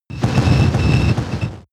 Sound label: military jet bomber shot damaged